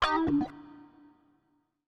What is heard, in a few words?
ELECGUIT, HOUSE, 125, A; eJay; Guitar